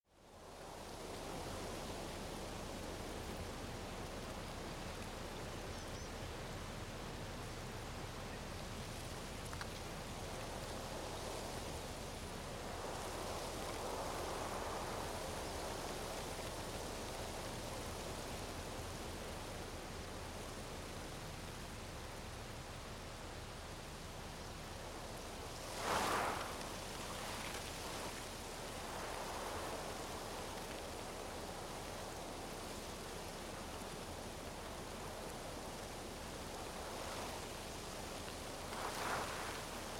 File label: leaf wind; Ambient and Specific Samples